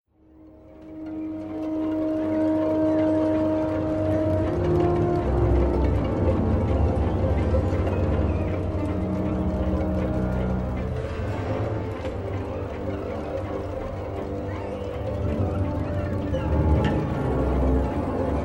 pad; Myst III Exile

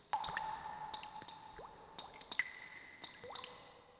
drop, CAVE; AMBIENT; Pirates Of The Caribbean

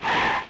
SLIDE; Crash Bandicoot The Wrath Of Cortex; XBOX

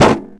wooden table hit; bouncyobjects; Tony Hawk's Project 8; XBOX

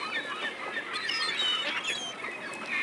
seagull loop; hongkong; ambience; Soldier Of Fortune II Double Helix; XBOX

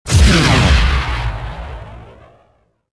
ion cannon

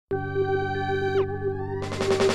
PICKUP; Marc Ecko's Getting Up: Contents Under Pressure; XBOX

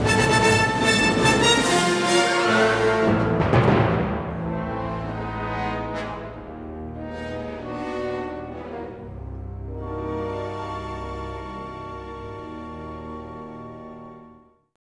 music flourish; extro; Star Wars Obi Wan; XBOX

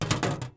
blaster hit metal; objects; Star Wars Obi-Wan; XBOX

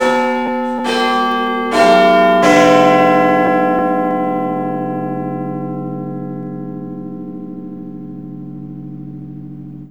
Big Ben; ambient; Project Gotham Racing

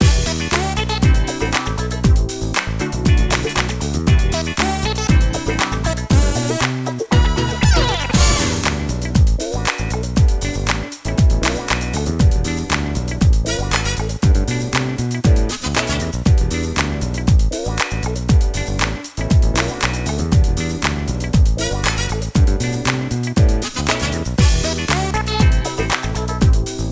Funky Disco, Music; Supergizmos

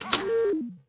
kitchen Table Switch; interface

